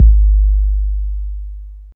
subby kick drum g sharp key

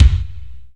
bumpin bass drum single hit c key

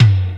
mid tom drum one shot f sharp key; tom drum samples

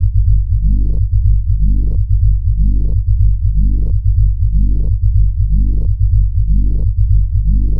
tech house bass Gm 123; instruments; loops

